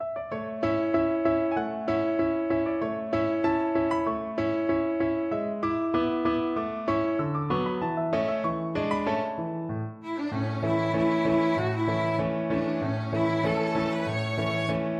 violin

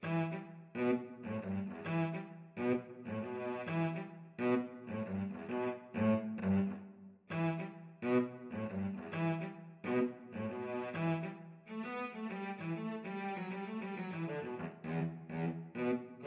Funk Study, cello

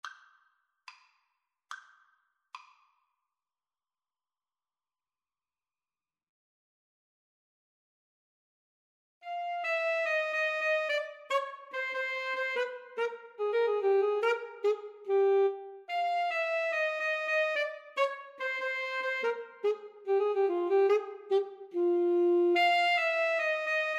bizet habanera 2 A SAX

